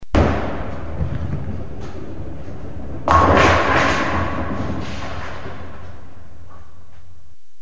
Ten Pin Bowling Ball

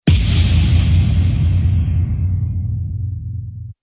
Chair Turn; The Voice

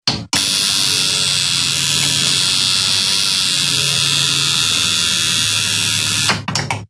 Tattoo Gun, A Tattoo Gun Being Used

